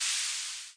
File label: cym, Hammond Auto Vari 64 drum machine; 1974